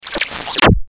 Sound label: Glitch Warble Hit, Distortion Warble Descend; Production Element Imaging Element Accent Transition